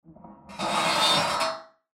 metal scraping eerie

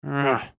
Grunt

